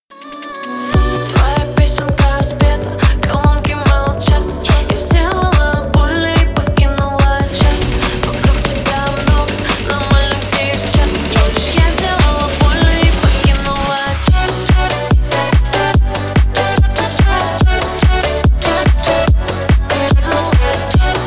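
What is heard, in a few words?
remix ringtone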